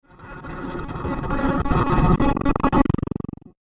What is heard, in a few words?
Broken Laser; Revenge Of The Broken Laser; Laser Gun Sound Effects; science fiction sound effect